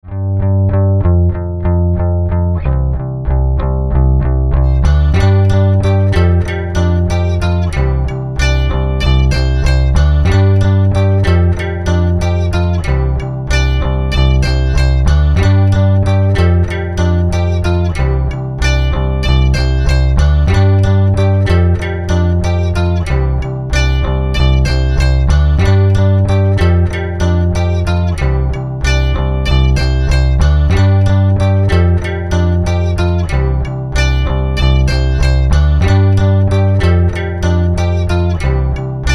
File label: mandolin ditty